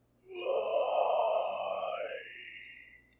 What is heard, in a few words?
Zombie death